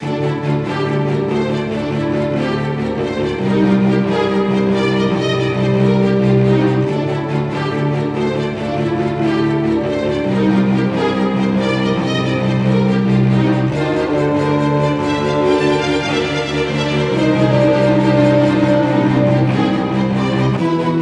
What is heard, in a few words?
level; yager windows xbox gamerip 2003